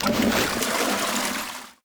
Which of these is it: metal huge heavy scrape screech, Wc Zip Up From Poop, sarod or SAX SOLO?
Wc Zip Up From Poop